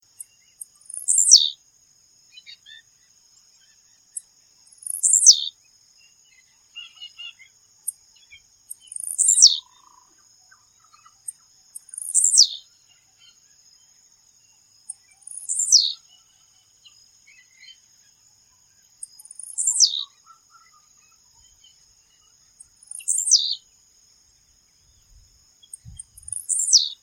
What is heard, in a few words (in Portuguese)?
Canto Tiziu